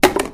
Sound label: paintcan impact hard, metal; physics